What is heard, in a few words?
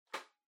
Portable Cassette Far Catch